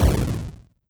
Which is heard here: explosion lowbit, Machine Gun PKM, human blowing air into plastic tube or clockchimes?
explosion lowbit